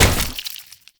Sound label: Destructible Plant A Burst B; Warframe; Ambience; Grineer